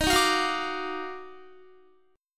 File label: Eb Minor Major 9th Eb down Guitar, chord; EbmM9 strummed